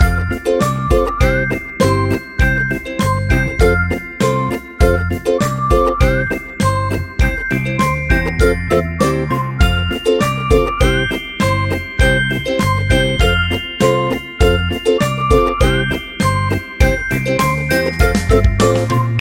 Games, ringtones; LEAGOO; M9

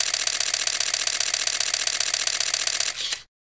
camera continuous mid long; Sharp SHL21

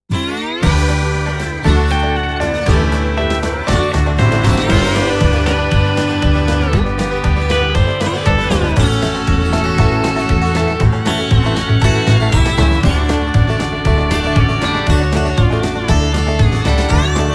Country Boots; SE Cool Country Boots; Sony Ericsson; PlusFourSix

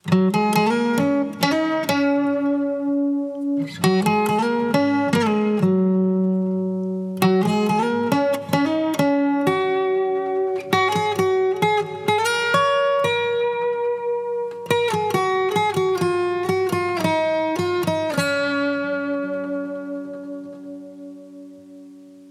ringtone guitar; Mudita Pure

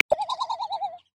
Great Frigatebird, Galapagos; Xiaomi Redmi-Note-8-Pro

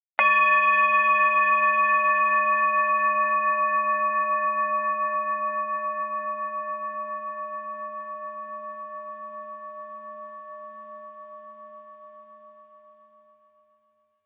Alarm Bell; Samsung; GT-I8320 Vodafone 360 H1; Ringtones

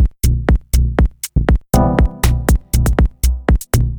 Deep House, Ringtones; Samsung Gear S3 Frontier

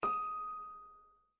Slide close; LG KF755 Secret

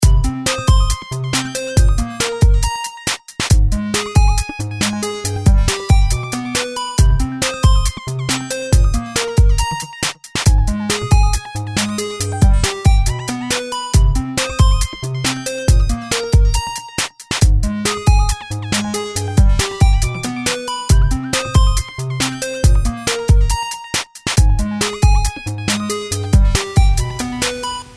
SYNTH; E-Club Ring Tone Pack 2

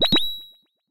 Spectrum, notifications; LG Zero H650

